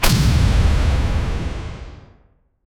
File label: short and powerful stone